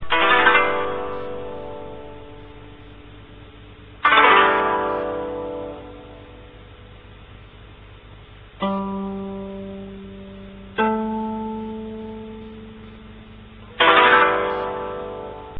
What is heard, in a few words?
wagon